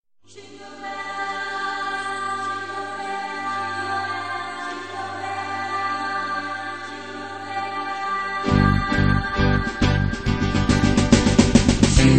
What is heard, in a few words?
Jingle Bells